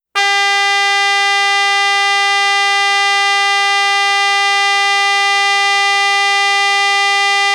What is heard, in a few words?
stereo, marine horns